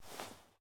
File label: armor; Smite; Cerberus